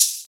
Clear Shaker One Shot F# Key, Royality free shaker sound sample tuned to the F# note; percussion